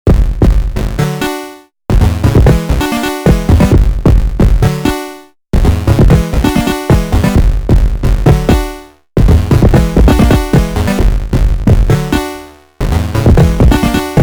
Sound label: HP Filter Drum Bass Filter FM